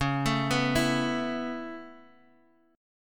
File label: Db Minor 7th Sharp 5th Db down Guitar Half Step Down